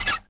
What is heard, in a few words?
Jingle; Otogirisou